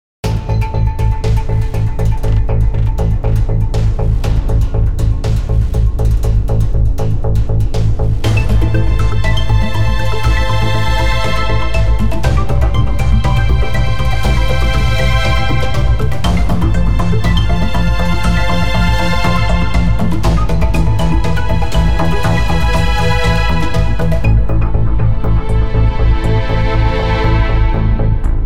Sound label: DRIVING DRONE